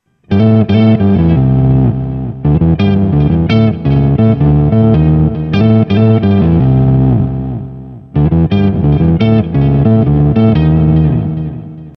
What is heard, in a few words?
oct div